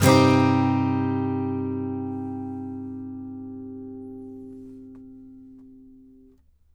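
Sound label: acoustic guitar C minor down, left; Country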